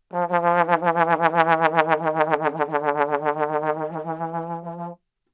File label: tremolo